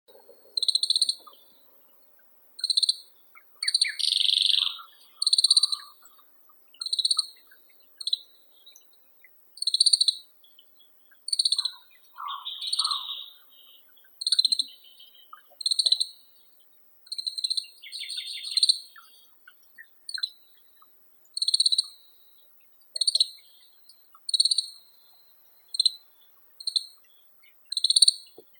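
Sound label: grey-capped greenfinch, Chloris sinica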